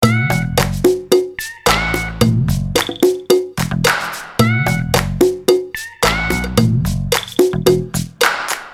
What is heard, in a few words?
Loop